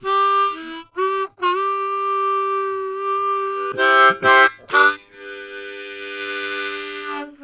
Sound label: Harm 129bpm G, Harmonica; Quazibeat - African Secret